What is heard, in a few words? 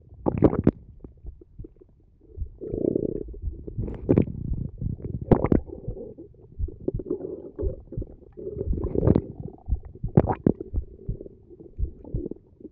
stomach soundscape; vore audio